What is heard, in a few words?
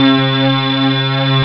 Grind Noise Lead; rare gems